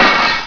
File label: dish break